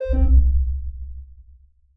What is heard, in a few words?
sonar Ping Air Close Beacon; Barotrauma; Real Sonar